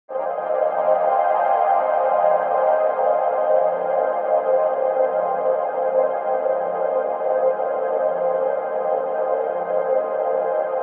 ambient dreamscape; Pads

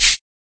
hat; Hats

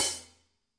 real HiHat; REAL LIVE KITS; A Real Freakin Drum Kit

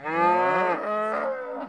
moo chorus; Cow; Cow Construction Kit

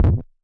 nasty stormfront; Nasty Stormfront Electro FX

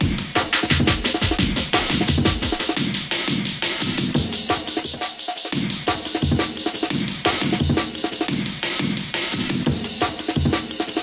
174 stereo dueling amens; 161 to 180 bpm

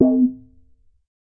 Processed Ethn Perc, Doru Malaia Percussion

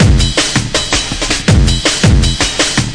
162 crunch the bang, drum loop; 161 to 180 bpm

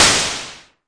Arturia MiniBrute noiz

